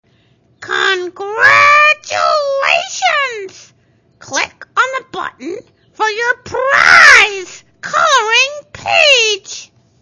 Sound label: game success